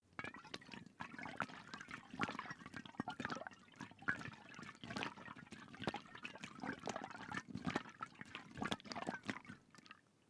hot-water bag glug sequence

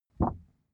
cardboard wobble short medium

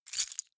key ring large place hard

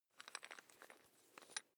electric drill bit fasten wrench turn slow

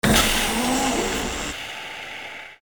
hydraulic door

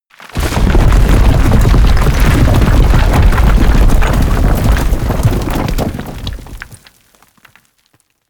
large roll drop

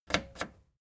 record player play click slow

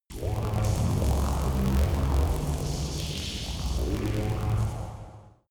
space inside biomedical alien spaceship

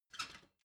ladder metal handling short

